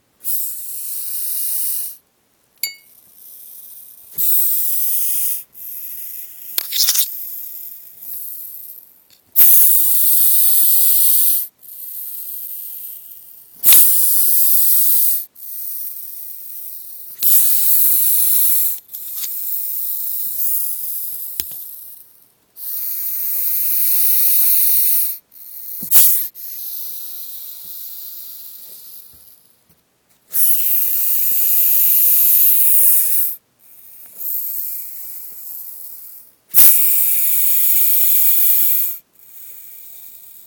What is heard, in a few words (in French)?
hognose sifflement